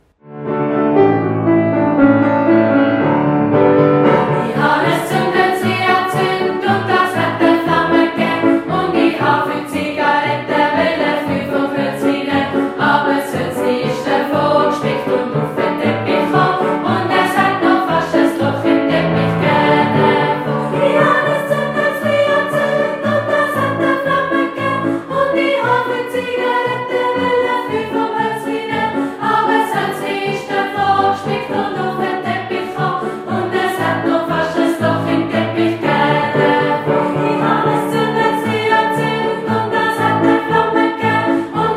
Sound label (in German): 3-stimmiger Kanon